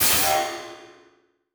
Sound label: Title Transition Burst; Production Element Title Transition